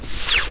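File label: Material friction reverse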